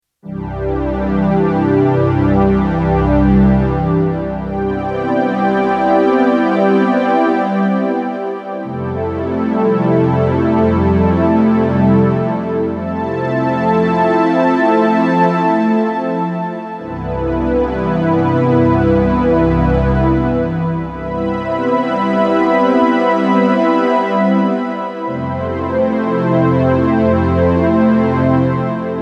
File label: str pad